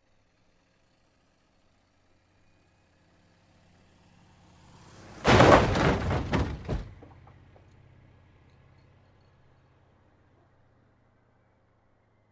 Approaching Car Crash

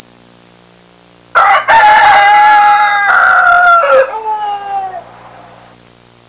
Farm rooster loud crowing sound

